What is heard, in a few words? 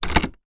household chicken coop wooden hatch shut; sound effects one